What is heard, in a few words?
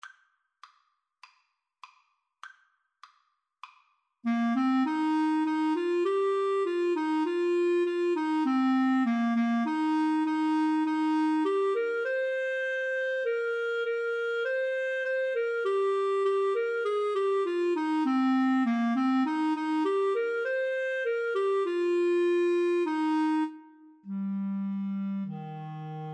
Clarinet Tenor Saxophone